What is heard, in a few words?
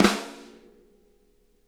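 snare rim flam; Sonic Reality; Interactive Drum Kits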